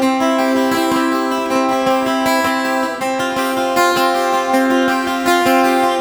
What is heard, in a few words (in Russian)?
Breeze, сэмпл гитары эмбиент